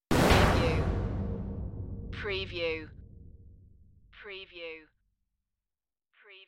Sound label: Trailer Production Elements - Hit - Sweep In, Metallic, Dramatic